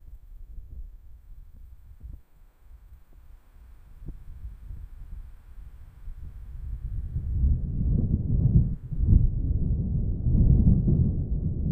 slow mountain wind; stereo

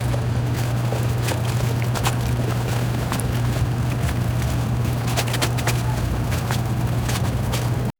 ambient chatter braying, fluttering

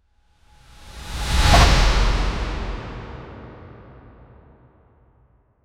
cinematic whoosh rise end, tail

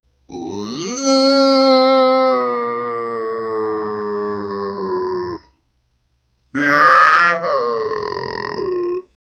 devil dog is here, beast

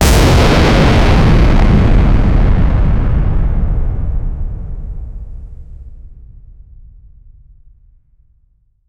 initial cosmic explosion; roaring

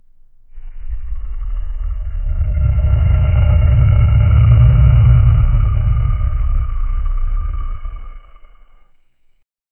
inhale then loud prolong

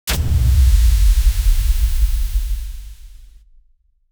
layered cinematic impact

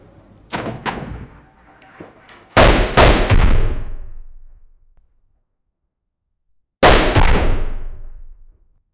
forcefully kicking down a, kick

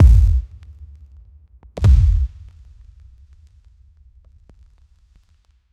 kick

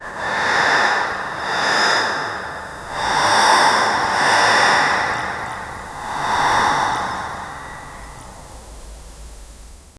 breath like hush sweeping, hallway

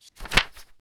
a whoosh sound effect lik, swish

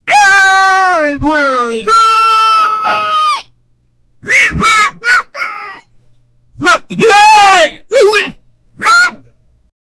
man screaming funnily; goblin

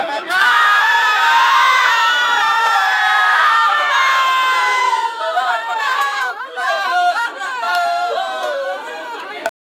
many people crying, grief

